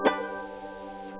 Musica Asterisk

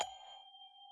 DING

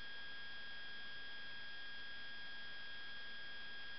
noise

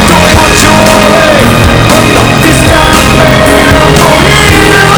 hero med filtered

